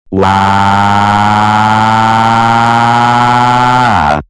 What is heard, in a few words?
motor car crying